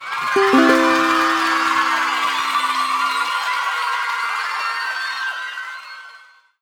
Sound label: Victory; Beefense BeeMastered